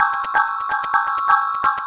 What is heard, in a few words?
VFX2 Loop 128 BPM